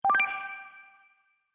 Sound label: Magic Idea; Magic Sound Effects